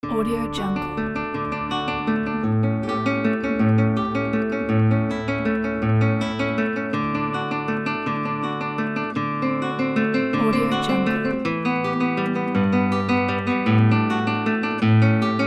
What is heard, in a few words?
guitar classical